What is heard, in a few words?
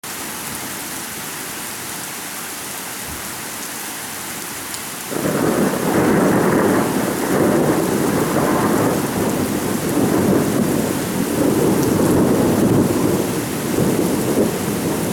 Gewitter Thunderstorm